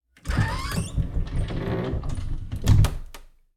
door close; Golden Crayon Sounds

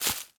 weed step; Golden Crayon Sounds

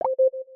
mic open Announce; iOS Sounds

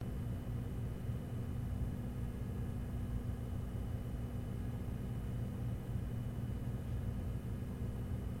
computer fan; Golden Crayon Sounds

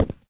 hardwood step; Golden Crayon Sounds

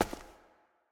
cave grounds step; Golden Crayon Sounds

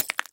switch off; ESAN VO Sound Schemes; ESAN VO Sounds Pack; Esan isounds add-on

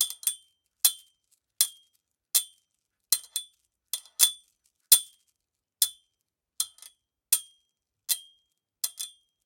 flagpole; Golden Crayon Sounds

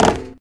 metal land; Golden Crayon Sounds

